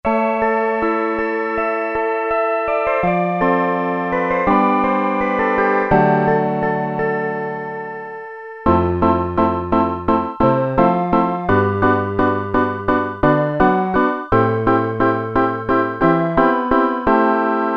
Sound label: Elec Piano; Tones